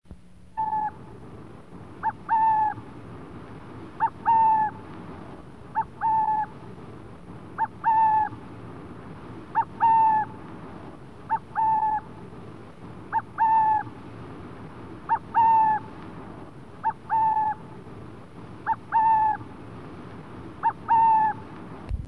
Burrowing Owl, Owl Calls; summer

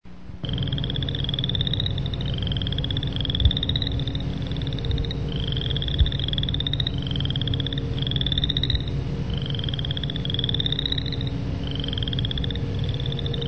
Boreal Chorus Frog; spring

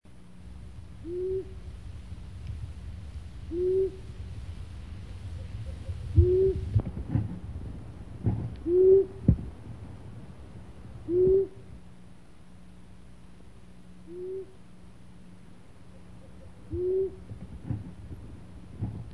Long-eared Owl; summer

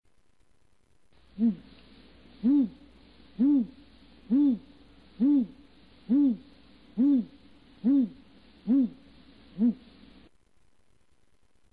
Hoot, Gray Owl

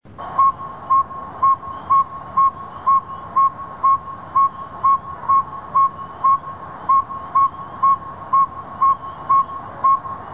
Saw Whet Owl; summer; Owl Calls

